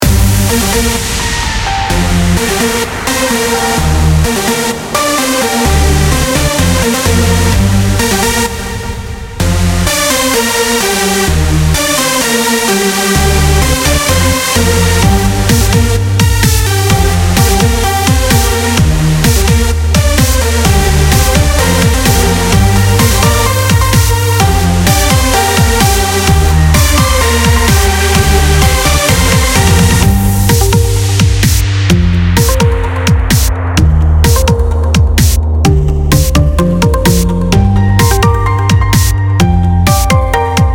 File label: White Noise BOSS CUT